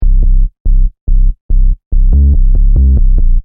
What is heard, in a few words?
Techno Trance Sub Bass Loop In C 142 Bpm